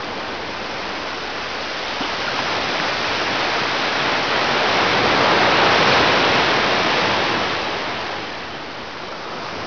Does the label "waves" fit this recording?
Yes